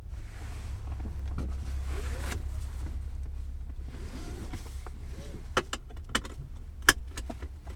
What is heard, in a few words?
Seatbelt Pull Click